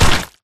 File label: Mvmt FS Jog Mud Layer; AI Stalker; Titanfall 2